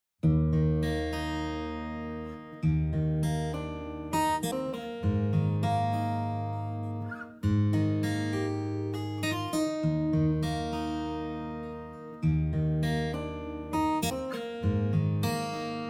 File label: only guitar kid